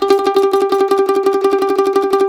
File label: CEE TRILL G L, Blues Guitar